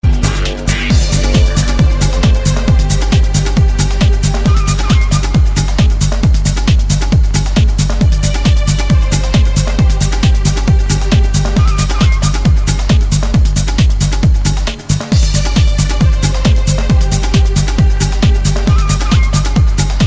arabic; rnb

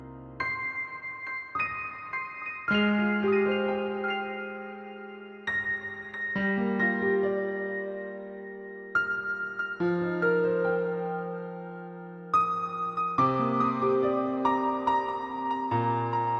Light Piano; XIV

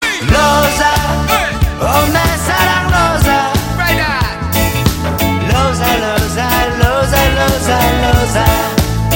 haha

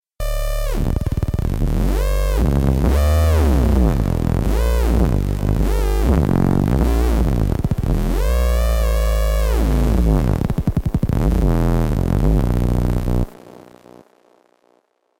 Distorted

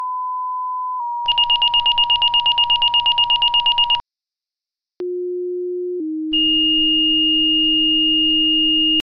SIREN

